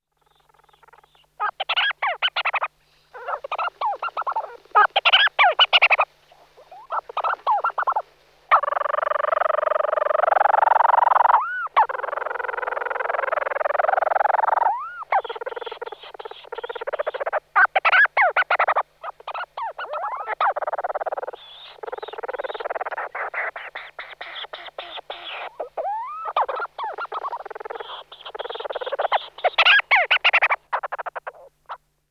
Burnacek dlouhokridly Oceanodroma leucorhoa

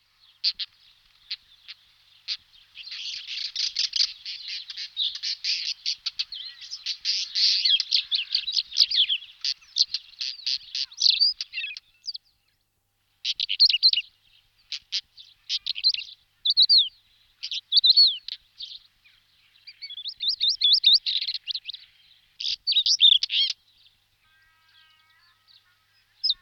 Belorit belohlavy Oenanthe pleschanka

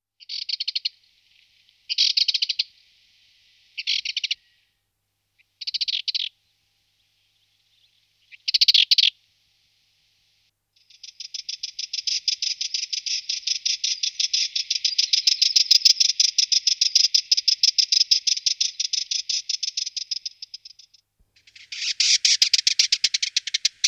Penice belohrdla Sylvia melanocephala

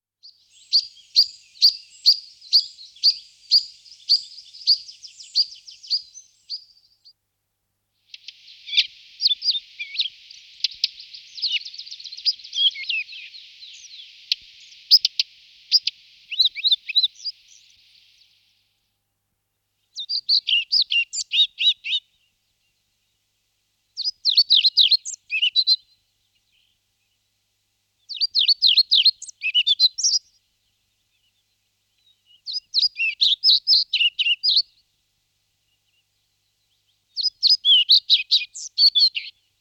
Lejsek cernohlavy Ficedula hypoleuca

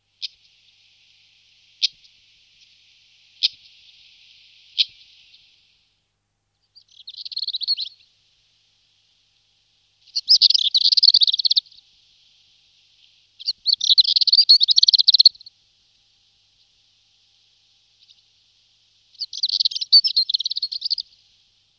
pěnice sardinská

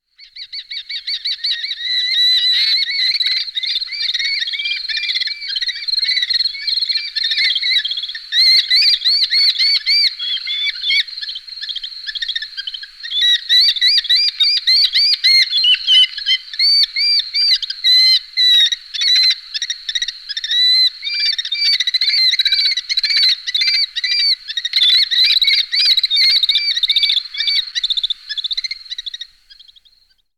Postolka rudonoha Falco vespertinus

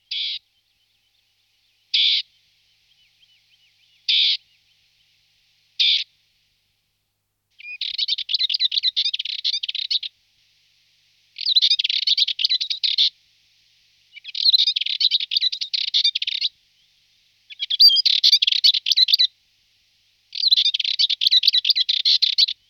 Penice kastanova Sylvia undata

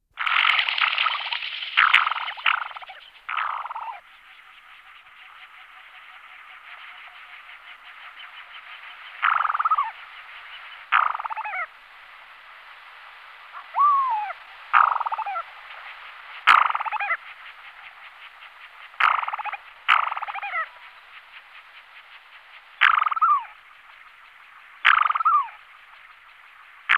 Stepokur pisecny Pterocles orientalis

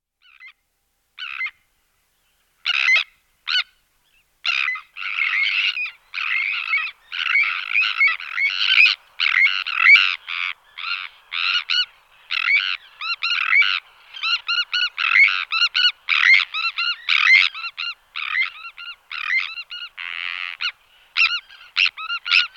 Rybak severni Sterna sandvicensis, nasiptaci

